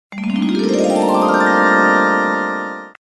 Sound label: magical wand, sms; ringtones